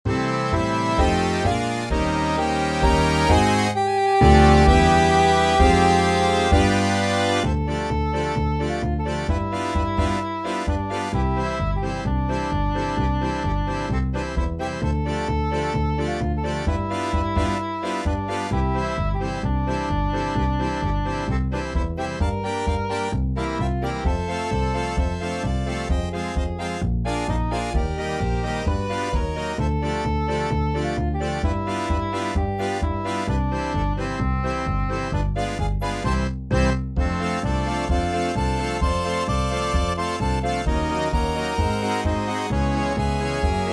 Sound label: Synthesized audio for study; harmonica; Yerxa